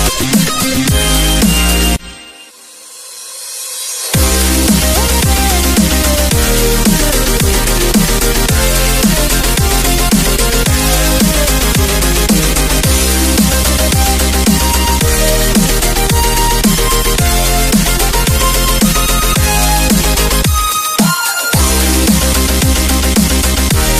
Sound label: High score Dubstep